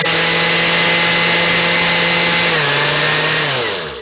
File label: household